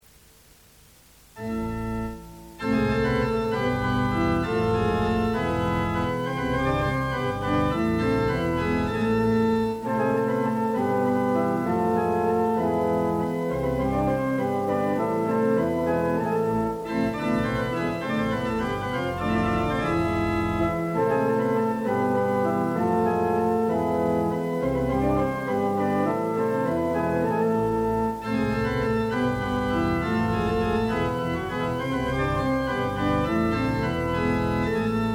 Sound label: hornpipe